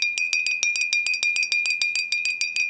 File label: bell